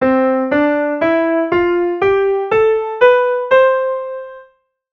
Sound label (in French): gamme do majeur